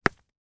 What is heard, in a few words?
impact stone rock hit floor